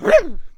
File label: Firework Archer Attack Vocals